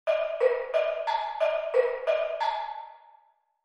Instr Tempelblock; unmittelbar aufschlag; Instrumentenkunde

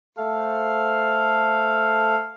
Sextakkord; Harmonielehre

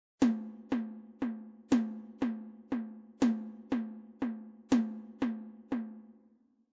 Takt Dreier

